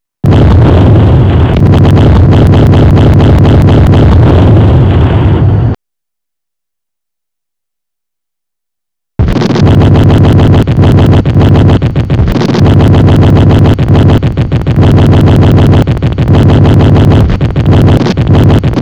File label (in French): BOOM